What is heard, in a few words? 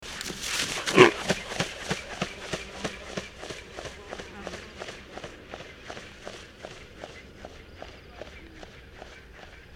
Kori bustard